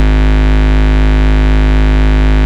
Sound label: Ultimate Bass G# Root G#; Vengeance Samples